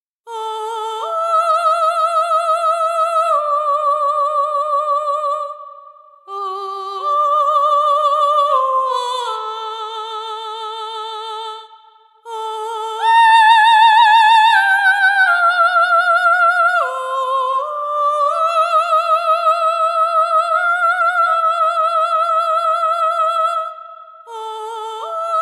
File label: VOICES OF RAPTURE; Soundiron